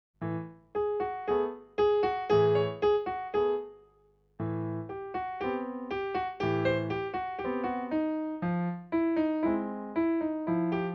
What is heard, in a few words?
Piano